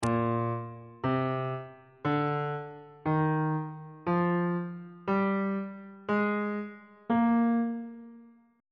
b flat mixolydian mode note positions on bass clef